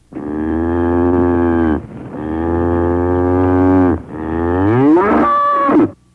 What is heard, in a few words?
Moo Cow